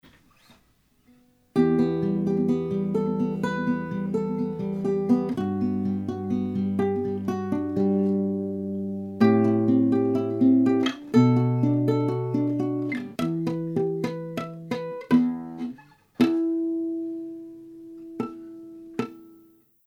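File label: Royer dBooster2 20dB Ibanez Nylon 60cm Sennheiser MD421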